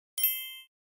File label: tip chime